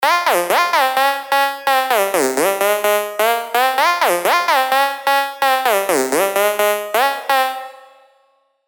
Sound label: Dirty Dutchers, serum 2 dirty dutch; revealed; dirty dutch vol 1